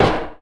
light shield

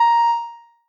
noteblock a sharp 2; mesecons

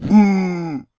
minothaur attack; server-nalc

